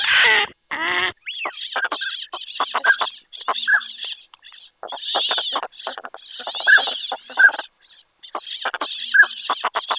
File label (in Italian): CODONE